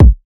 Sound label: Kick Stuborn